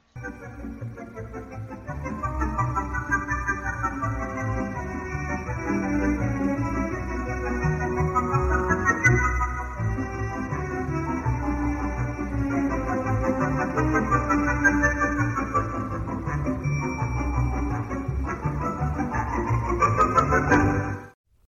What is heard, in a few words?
Jingle